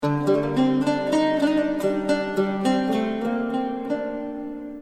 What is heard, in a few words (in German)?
laute